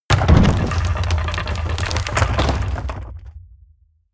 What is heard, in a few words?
mechanical lever wood large down